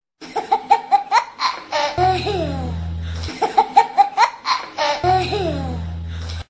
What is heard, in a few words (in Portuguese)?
Risada Infantil; Vinhetas